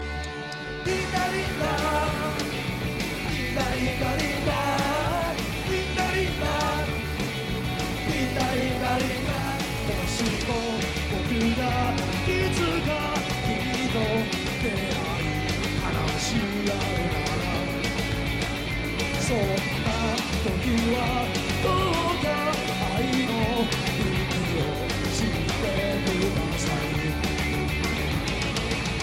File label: Is Calling Suspense